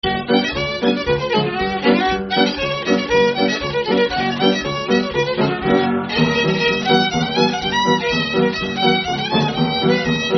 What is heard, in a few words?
Jig In G